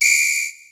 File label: start game; zuqiu